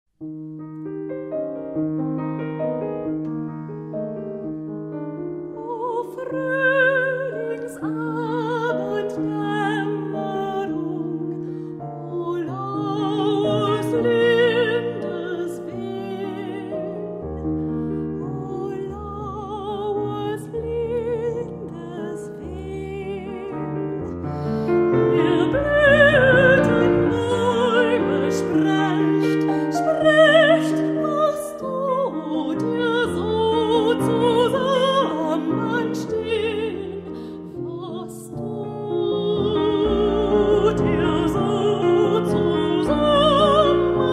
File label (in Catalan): Piano Veu